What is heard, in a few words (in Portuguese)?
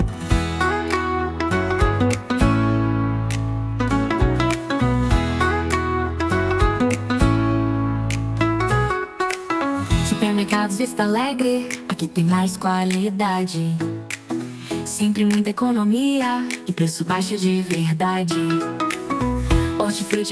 Jingle; Vista Alegre